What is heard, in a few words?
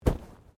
Bodymovement Fall Light